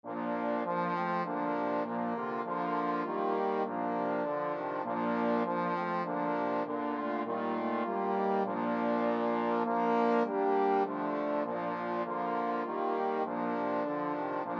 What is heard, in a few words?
trombone quartet